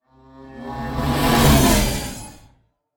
Metal wires transition

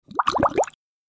Cartoon bubble

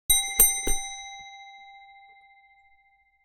Multiple impatient rings on reception desk bell

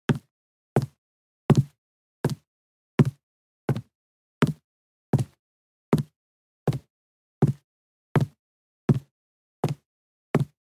High heels walking on carpet

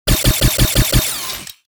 Sci fi sidearm semi automatic bursts

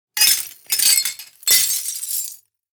Multiple glass shards drop with debris

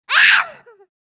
Young girl shouting in anger

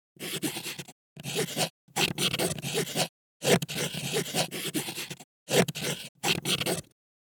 Pen writing on canvas short and irregular

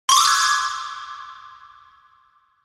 Anime warning accent

